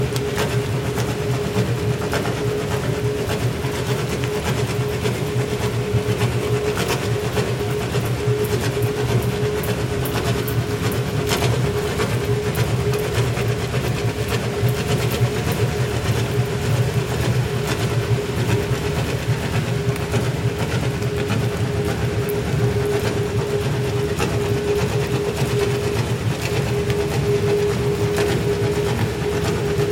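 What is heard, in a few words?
northbound bulker 15kt; SRKW vessel case study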